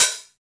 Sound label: HH, Dance Kit, Roland